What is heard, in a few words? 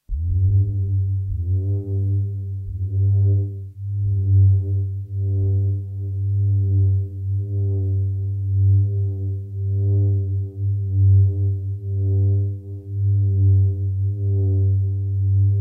sub bass; Elden: Path of the Forgotten